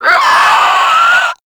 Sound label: Infested Crawler Attack Short; Warframe